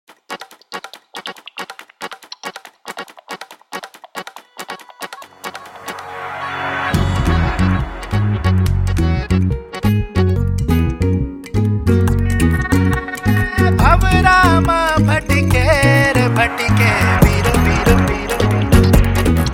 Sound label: Instrumental; shuddh desi romance 2013